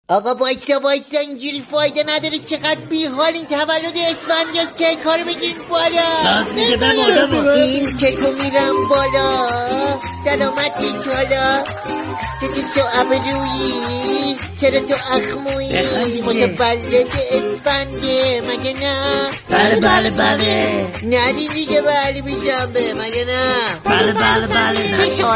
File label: music happy birthday